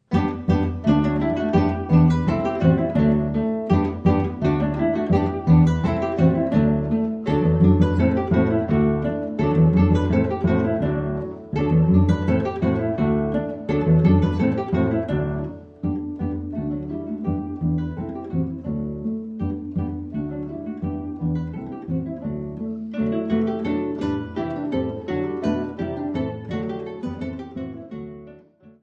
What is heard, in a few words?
Carillon, Guitar Ensembles; Quartets; Quatre Pièces - D'un Vieux Jardin; D'un Jardin Clair; Nocturne; Cortège.